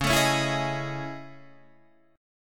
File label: A Major Sharp 11th, Db